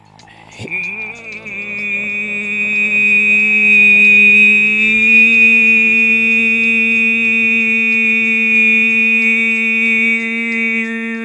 MONGOL V HI, Asian Traditions; USB Soundscan vol.60; AKAI

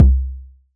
Kick, Drums; Bitkits

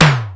sde e1, CASIO FZ-1 Special FX; Retro Sampler

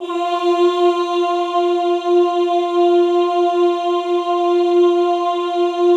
OOH F3, Classical Choir; Best Service; Extended Classical Choir; OOH MIX

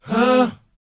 BOYZ A, Vocal Planet R&B & Dance; ILIO

